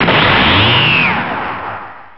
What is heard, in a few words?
stimpack